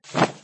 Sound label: ninja jump; nickelodeon basketball stars 2015